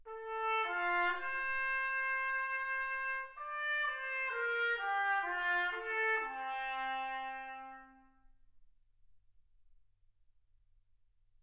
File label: trumpet